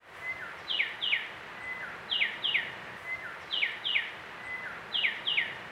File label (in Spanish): PÁJARO CEJA DE SELVA FONDO RÍO URUBAMBA CUSCO